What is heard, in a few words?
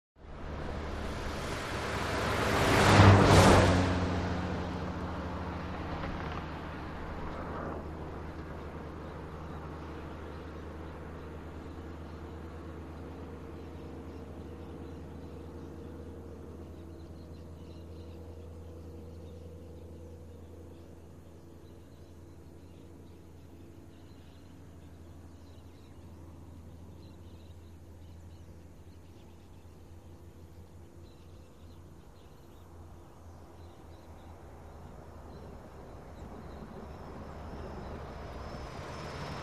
Traffic; Highway; Sparse Close Perspective Highway Traffic.